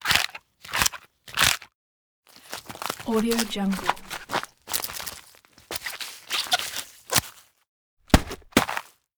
cactus crunches

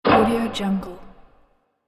asylum horror atmospheric noise

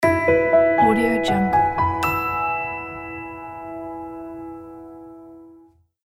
piano logo

